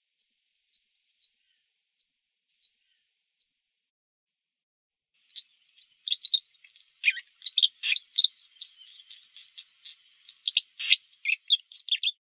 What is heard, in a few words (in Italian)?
Merlo acquaiolo Cinclus cinclus; Versi Animali; Il Forum della Fotografia Naturalistica